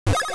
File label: command block; GBA; MLSS